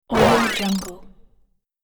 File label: upgrade retro power pack